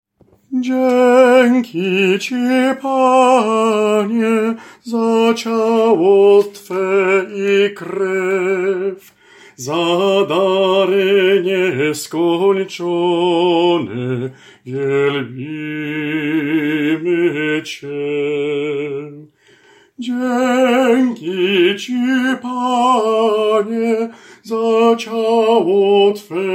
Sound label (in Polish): SOPRAN; Dzieki Ci Panie